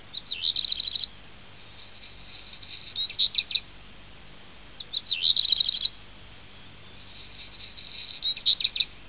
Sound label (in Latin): Phoenicurus ochruros